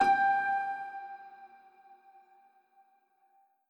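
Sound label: Music Maker Instrument 3 G; barbie dreamhouse adventures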